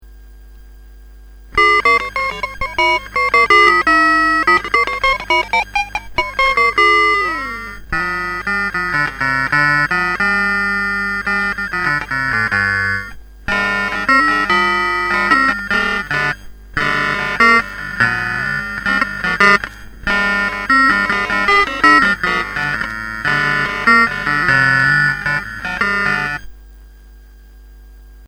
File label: maestro ring mod